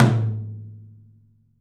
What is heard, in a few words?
TOM; 3D Sound; 3D Drums